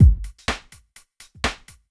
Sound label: DEEPHOUSE BEATS 125